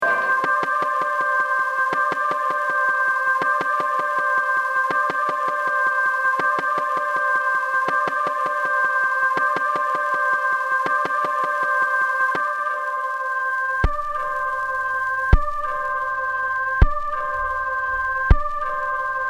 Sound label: Loop; needle drops